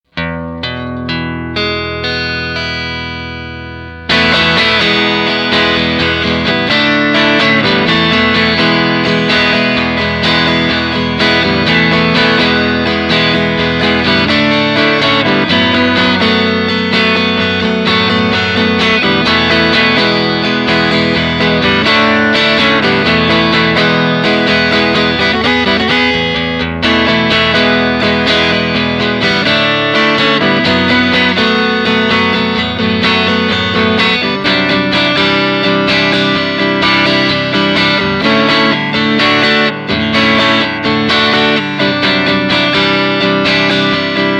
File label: vox tone